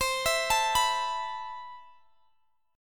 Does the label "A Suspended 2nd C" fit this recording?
Yes